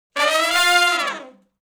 Fast Climb Up (F) unison, Horncraft; Sections

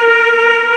MEL BOYS A#2; Keys & Pads; AKAI S6000 CD-ROM - Ultimate

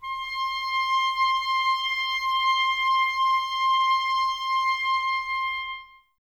Clarinets, Wood Winds

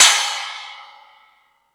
Hip Hop, Drum Machines E-MU Pro-Cussion

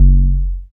BAS FM DX-7

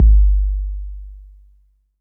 808 tape kick clean

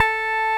Roxichord A 4, RMI E.Piano; Keyboards of The 60's and 70's; Roxichord 1

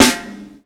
Snare, breakcore Drum Hits

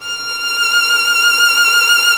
violin, Solo Instruments

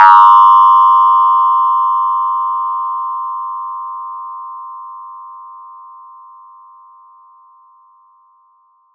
FX RINGR; ModelCycles

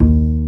BASS, AC BASS, AKAI S6000; AKAI S6000 CD-ROM - Volume 1

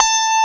A4 STRAT

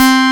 synth bass C4

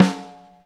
Snare, Drum & Bass; 90 s Sample CDs; Total Drum&Bass

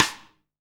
DR RIM3S; Best Service Gigapack 2

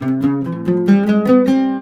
LUTH, Arabian Traditions

